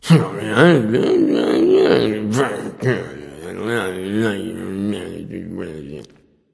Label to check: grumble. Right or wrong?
Right